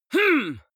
male fight groan; vocals; comedy-cartoon; soundpool

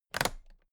toolbox latches close heavy

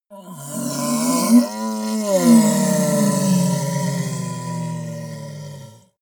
monster dark growl hoarse; kreaturen

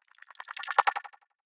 sci-fi subterranean light up high; swooshes-swishes

